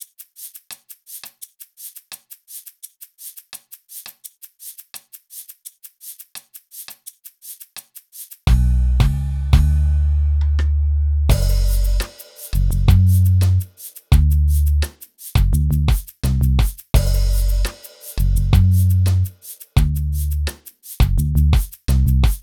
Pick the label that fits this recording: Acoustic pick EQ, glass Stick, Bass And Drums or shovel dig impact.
Bass And Drums